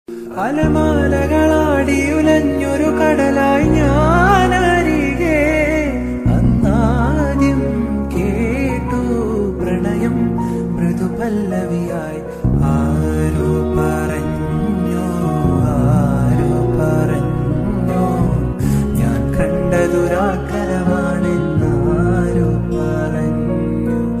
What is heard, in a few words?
cover song, romantic Malayalam ringtone